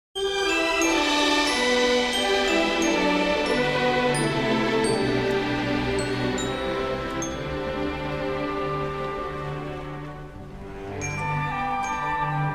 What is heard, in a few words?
Choir -